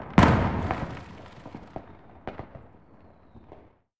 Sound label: Missile Hits Brickwall